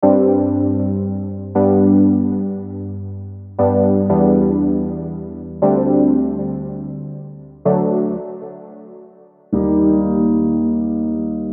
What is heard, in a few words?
Ionian Natural Major Chords